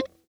c5 release, glowlybass

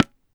tabla te; drums; one-shots

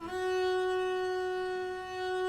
gb4 down, arco looped; meatbass

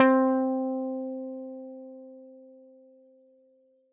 c5 fff, swagbass

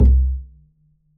perc, pizz; meatbass

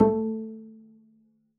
pizz a3, double bass

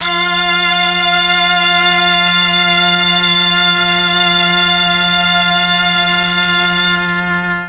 F3 MIXED STR, mellotron; MIXED STRGS

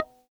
eb5 release, glowlybass

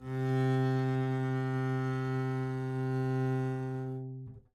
c3 down, bass arco; meatbass

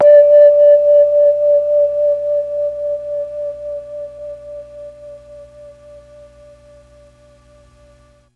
D3 VIBES, mellotron; MKII

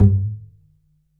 perc, pizz; meatbass

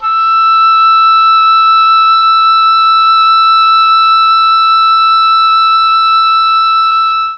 E4 OBOE, mellotron; CHMBLN OBOE

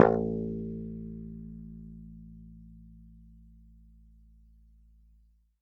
a2 fff, swagbass

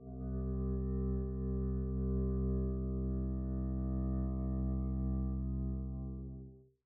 arco c2 pp up, double bass

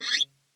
scrape, glowly bass

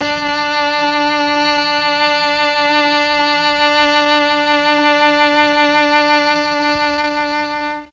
D4 mellotron cello

